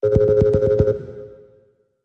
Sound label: Fire Storm